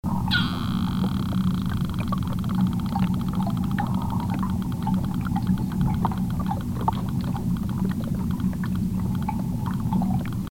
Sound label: fish fart